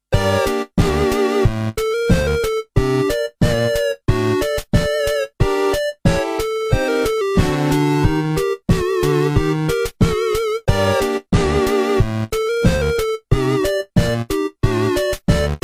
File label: Hymn; Lord Laharls